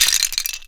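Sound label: debris small; LEGO Star Wars Force Awakens; Junkbot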